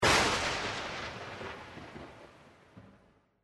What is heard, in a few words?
Lightning Strike; slots; Halloween Blast